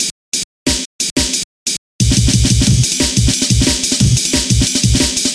Pop off amen break

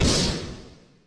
Shotgun

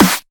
Marker

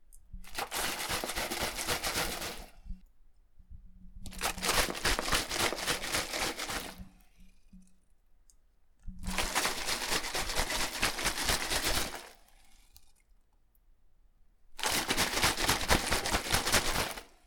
Shaking Water

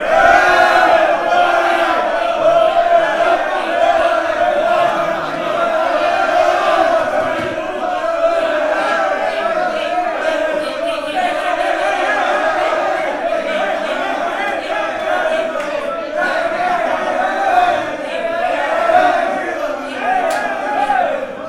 Group Fighting

